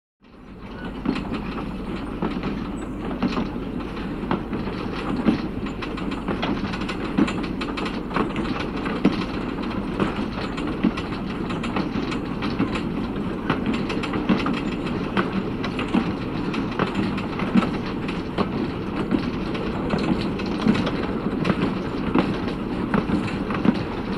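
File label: Steam Train Exterior Steady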